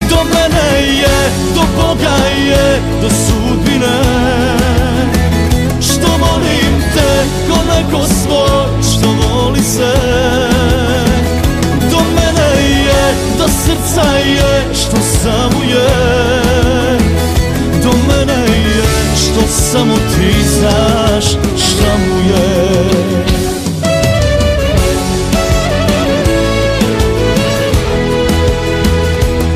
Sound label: Tribal